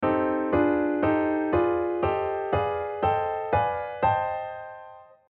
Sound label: 6th dim minor harmonized